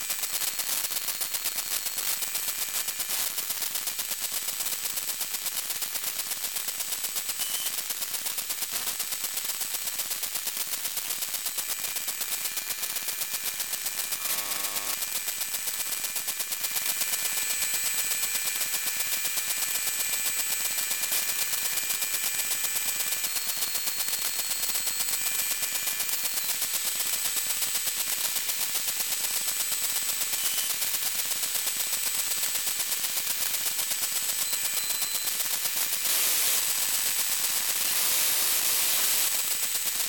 Electric, Electronic Data, hard disk, pulse, crackle, spurious, long; Sound Sower